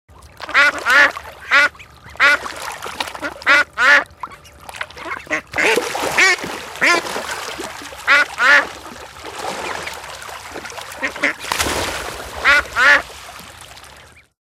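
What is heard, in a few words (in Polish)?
odgłos zwierząt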